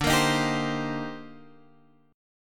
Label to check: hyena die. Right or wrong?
Wrong